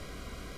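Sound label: Farfisa Clarinet Noise, organ; Roland LCDP09 Keys of the 60s and 70s 1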